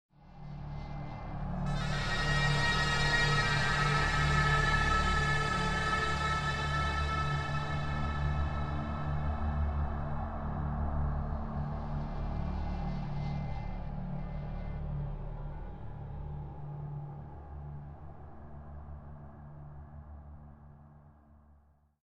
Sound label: Transition Drone; Dead Rising 2: Off the Record